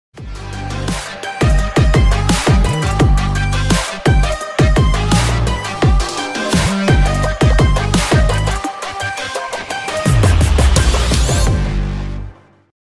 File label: REDMAGIC 7, sonnerie